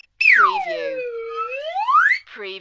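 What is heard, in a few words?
Slide Whistle Yo Yo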